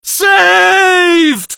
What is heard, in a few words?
save